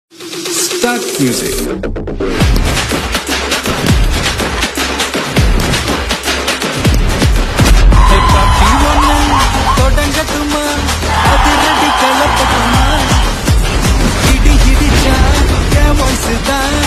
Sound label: Whistle Ringtone